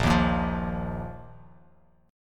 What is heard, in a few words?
A sharp Suspended 4th Bass Standard; strummed; A#sus4; JGuitar